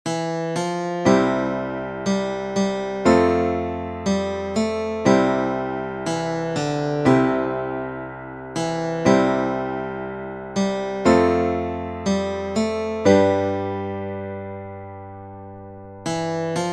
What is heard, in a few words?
guitar, fingerstyle solo